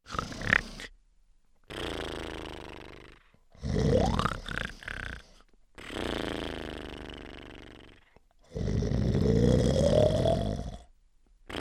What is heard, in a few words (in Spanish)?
ronquidos suaves